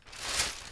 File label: plastic bag